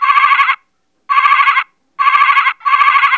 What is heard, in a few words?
sheep